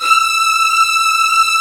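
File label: violins E 3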